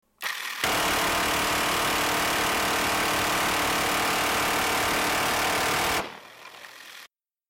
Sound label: shooting; mg 1